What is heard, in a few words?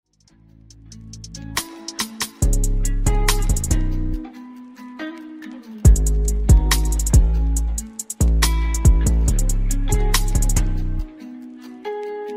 Instrumental